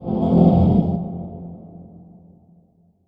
Transition Whoosh Drone; Production Element

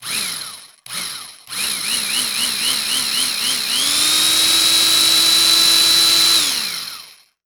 Industry Tool Saw Electric Saw Pulsing

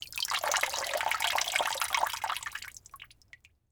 Liquid Water Water Drips

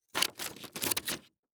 Household Scissors Cut Paper Short

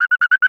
Animal Frog Pinewood Tree Frog Warning Call; animals; AdobeCC

